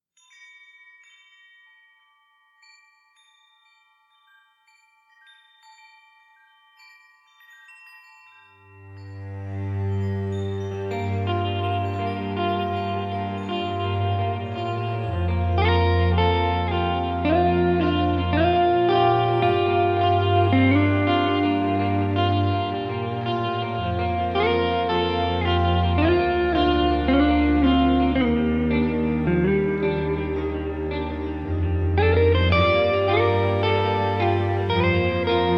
instrumental

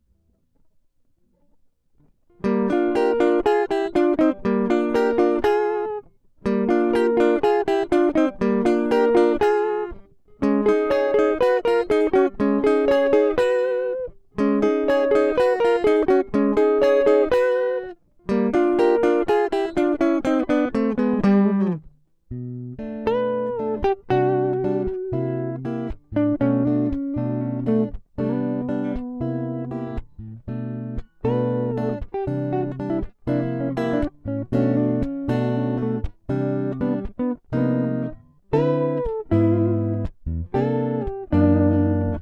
instrumental

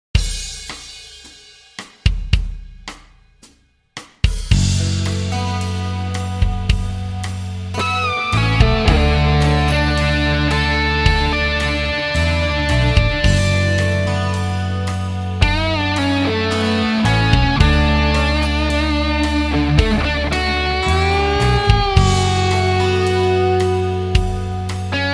vento frio chuva quente cold wind hot rain

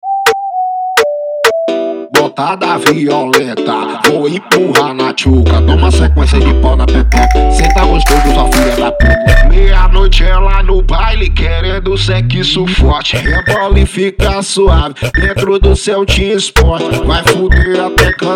bregatrap

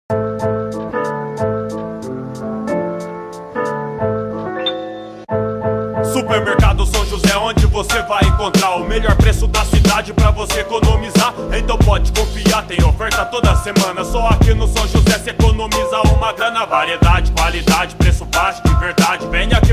rap; sao jose

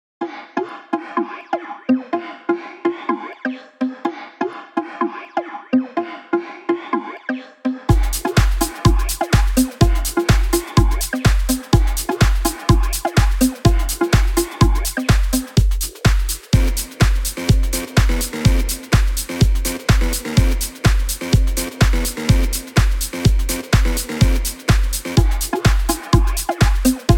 house beat music

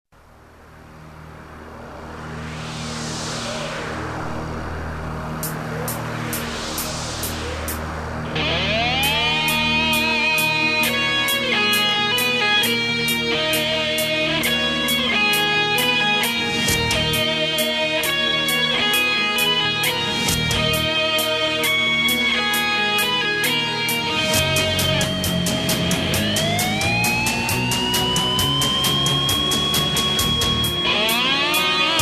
solo jam

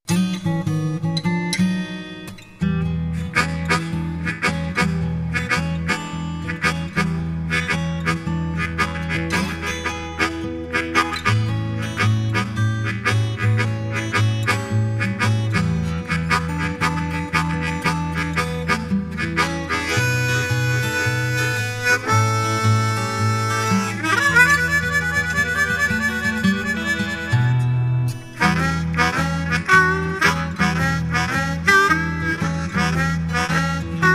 blues

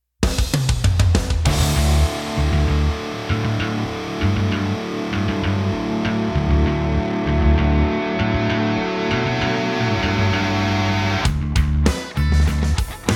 instrumental

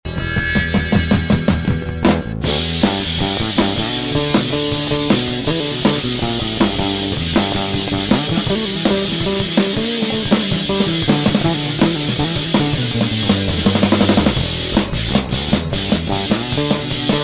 bass solo

